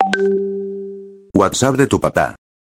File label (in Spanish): whatsapp de tu papa